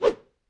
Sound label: Imp Attack Whoosh, Melee Whooshes; Foley